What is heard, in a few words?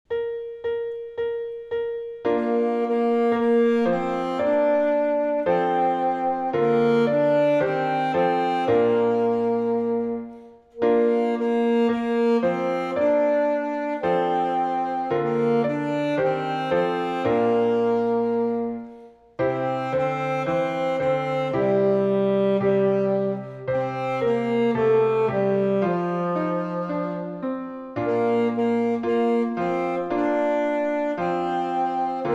Alto Sax Clair de Lune; Suzuki Volume 1